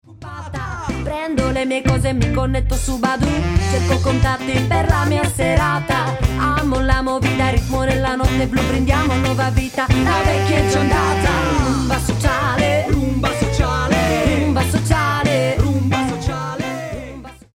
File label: Rumba